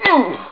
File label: grunt; SMSoccer